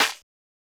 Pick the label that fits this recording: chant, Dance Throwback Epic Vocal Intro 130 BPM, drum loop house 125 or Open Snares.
Open Snares